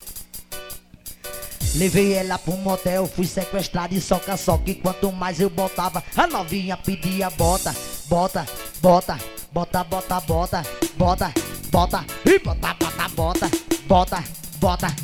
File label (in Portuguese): pisadinha